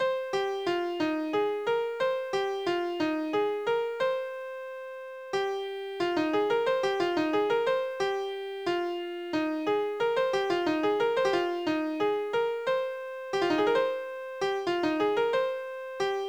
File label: tone